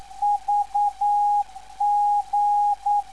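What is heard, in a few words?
VG 325kHz, non-directional beacon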